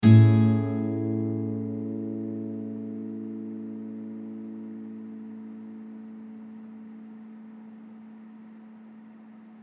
A 100 Mellow Acoustic GTR

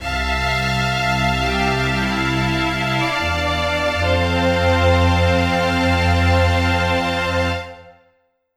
Strings C-F min; Sunshine Meadow

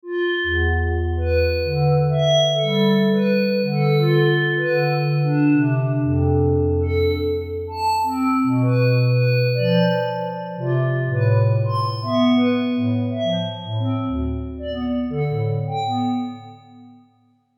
Glass Flute Loop

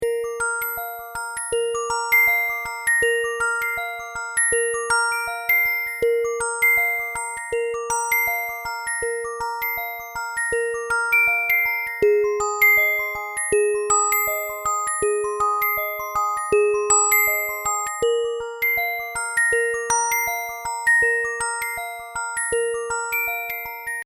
instrumental

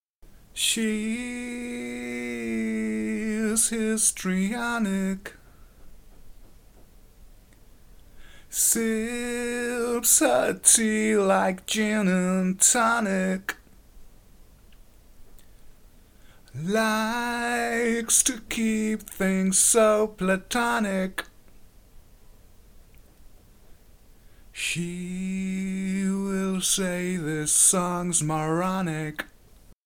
E120 Histrionic vox

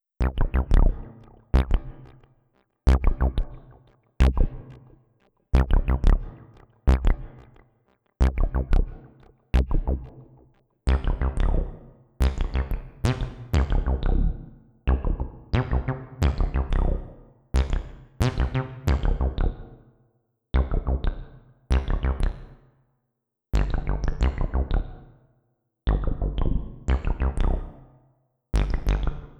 A Flute

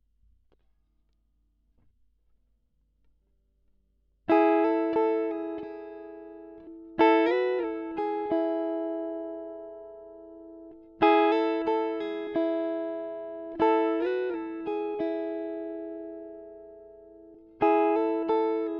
E90 Gtr Riff

